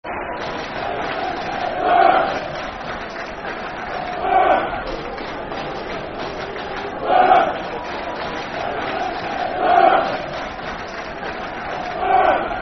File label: Clap, Football Chant (Soccer Song); Boro Clap; Peterborough United Pufc